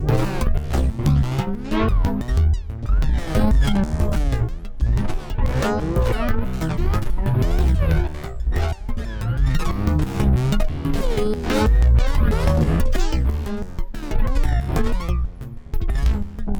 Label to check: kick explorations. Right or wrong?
Right